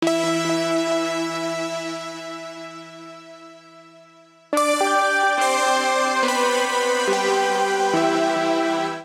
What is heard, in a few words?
Lead Am 106 Bpm; Antenna